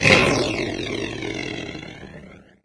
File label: death, doom3 pinky